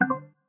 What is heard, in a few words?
generic failure; Samsung Galaxy S10; Velvet